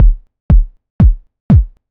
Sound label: Drum BD